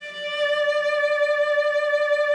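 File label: D2, cello vibrato; Xsample VOL-7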